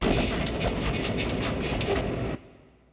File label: alien signal; Stellaris